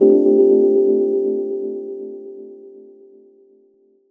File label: Chords Tones; Equipped Music; Loungin House